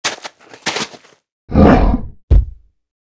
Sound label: Triceratops Action; Seer; GamigoNA